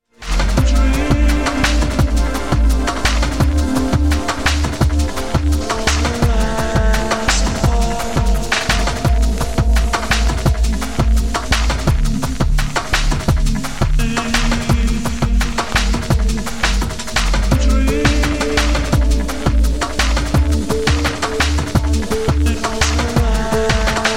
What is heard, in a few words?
machinedrum rise n fall